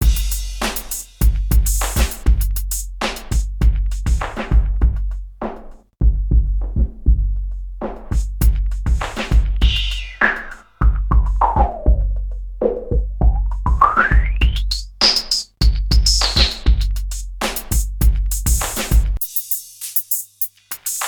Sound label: redsound darkstar filter filterinput